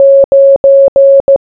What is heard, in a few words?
Morse Code Number 9 Sound